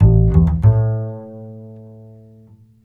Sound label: BASS LP, Drum & Bass, 150 BPM; Ueberschall; Drum & Bass Flash Trackz